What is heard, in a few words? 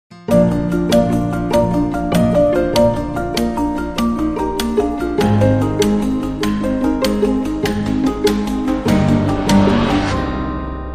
Long Sting